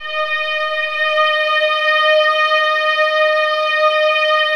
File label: VIOLINS L, Synclavier Strings; ILIO